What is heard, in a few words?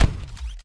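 flak canon grenade launch, mortars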